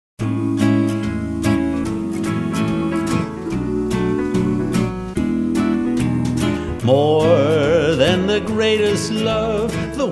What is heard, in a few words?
More Swing voc.